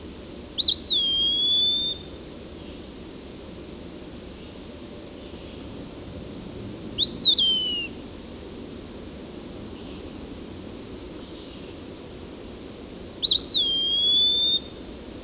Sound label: Eastern Wood-Pewee; ibm glen